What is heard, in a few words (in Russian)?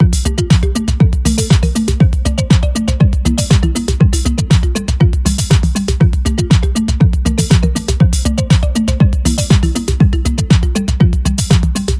muzyka dlya igr